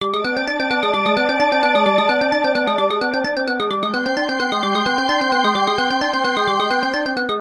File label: count up; Book Of Queen